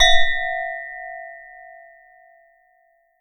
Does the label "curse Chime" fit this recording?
Yes